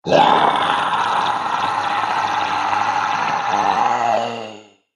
Alien Ogre; Alien Sound Effects

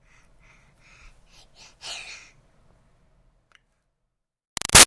Baby 6 Month Old Breaths Effort; Babies

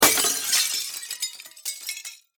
Impact Glass Break Smash; Materials

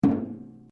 RUBBER ON METAL HOLLOW; Materials

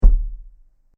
Bang On The Door, Door Knocking; Door Knocking (Sound Effects:Doors)

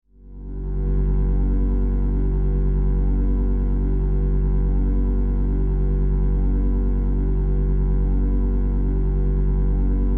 Science Fiction Electrical Hum Drone; Drones; Sound Design; SFX Bible

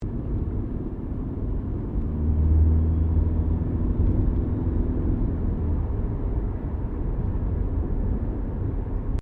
CAR SUBARU LIBERTY INTERIOR ACCELERATING; Family members Subaru; Subaru Liberty 2001

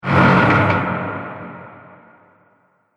Haunted House Door Creak; Creepy Door

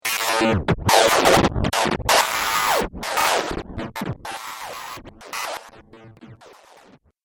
Break This Spell, magic; Role Playing Game; Andrew Fleming

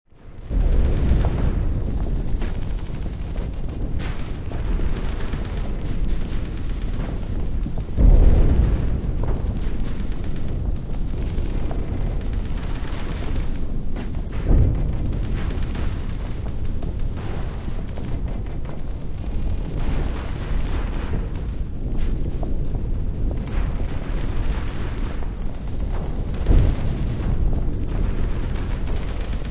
Warfare World War Two Battlefield Active; WWII; Battlefield Sound Effects

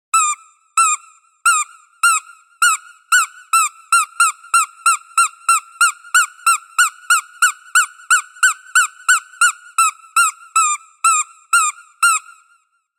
Squeaky Toy, Squeaking of rubber toy; Squeaks; Objects

